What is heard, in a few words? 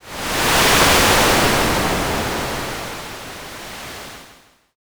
wind